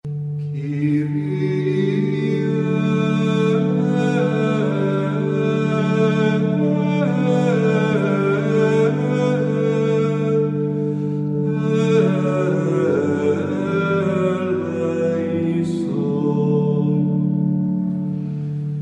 kyrie eleison

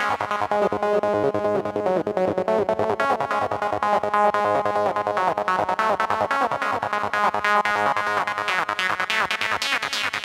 Goa Synth; MadScientist; LoopinMadScientist

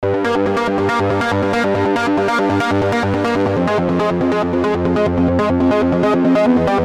Fight Synth Loop

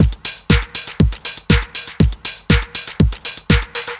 Trance Beat

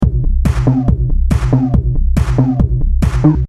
space bass remix; dahl

